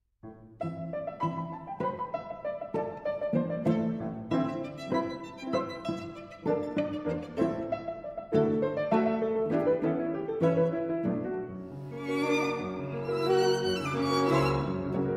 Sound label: piano trio in A minor; MAURICE RAVEL; Pantoum Assez vif